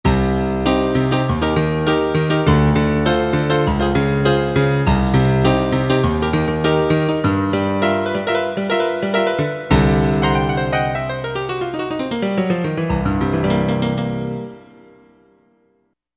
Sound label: Piano Brite